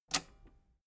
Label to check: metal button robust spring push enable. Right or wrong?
Right